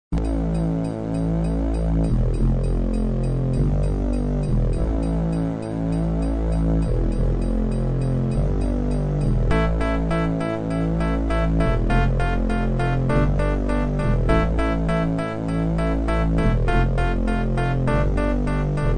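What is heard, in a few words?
Music Sound Blaster Adlib; Raptor; Call Of The Shadows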